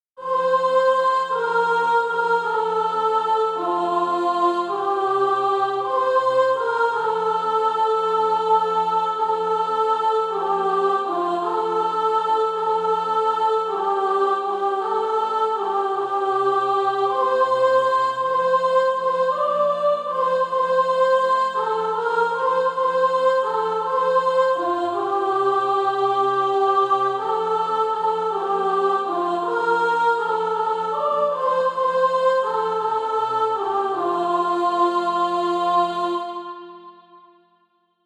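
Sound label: soprano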